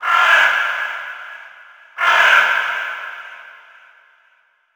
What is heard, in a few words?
environment crow